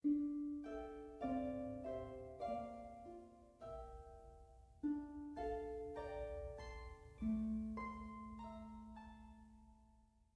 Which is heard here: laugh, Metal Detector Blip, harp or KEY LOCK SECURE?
harp